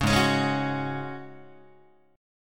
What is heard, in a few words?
G sharp 7th Suspended 4th G sharp, Guitar; G#7sus4 strummed; JGuitar